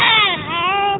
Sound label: crying, baby cry